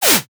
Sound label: whip whoosh